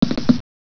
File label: Footstep; PC; MARIOSFUNDAMENTALS